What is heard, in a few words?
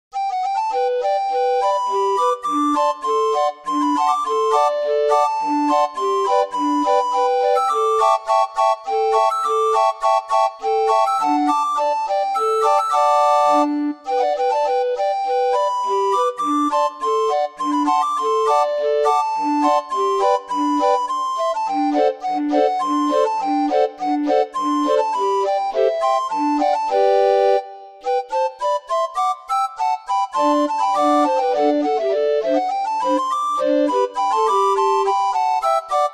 Menuet Boccherini, orgue barbarie